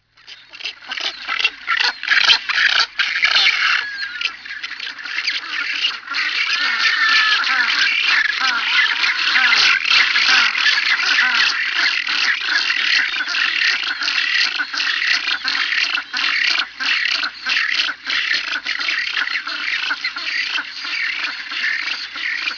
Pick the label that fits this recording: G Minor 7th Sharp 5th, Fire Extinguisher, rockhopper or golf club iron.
rockhopper